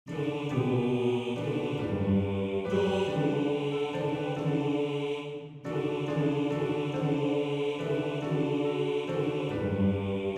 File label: Bass; Schifferlied; Bass 2